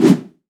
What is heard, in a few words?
projectile swoosh; Garry's Mod; tf2; passtime